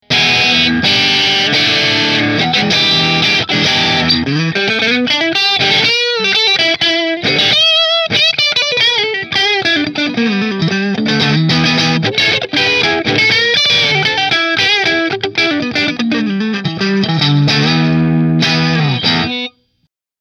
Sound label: Koa Middle Marshall